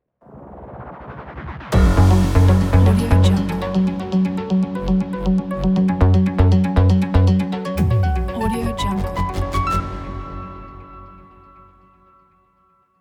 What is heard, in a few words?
cyber news logo